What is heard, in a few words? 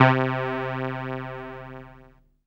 SIEL, String Machines